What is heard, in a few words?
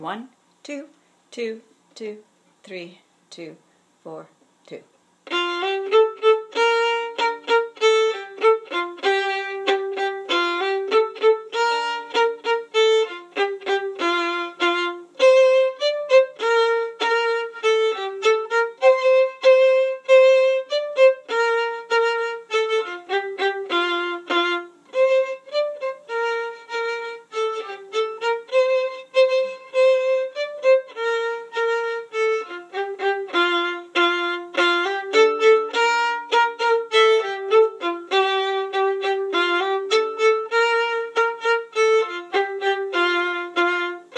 violin